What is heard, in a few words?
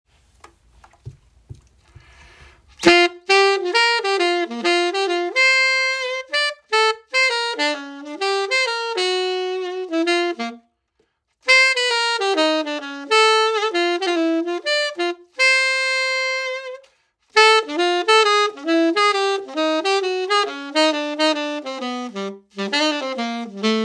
Jazz Tenor Sax